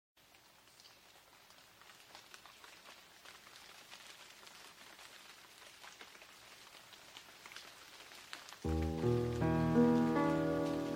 Beautiful Bamboo Flute Zen Relax Music Nature Tropical Forest Sounds